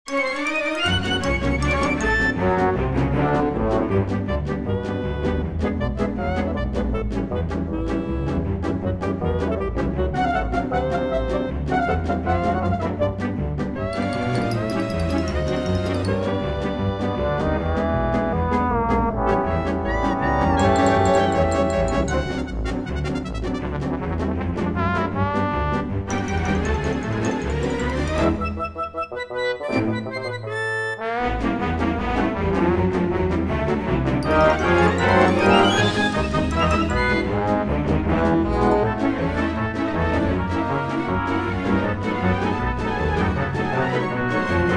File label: e-mu virtuoso 2000, cartoon; factory demo